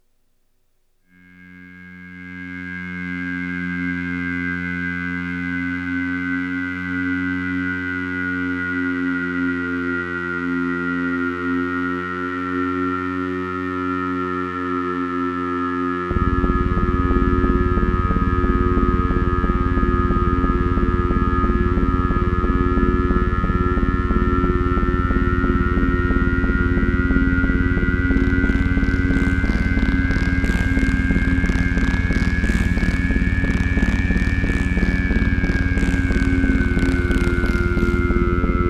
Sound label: noise